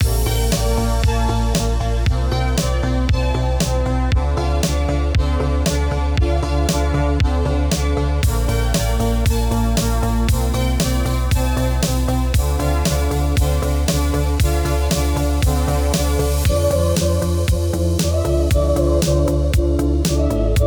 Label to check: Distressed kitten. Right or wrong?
Wrong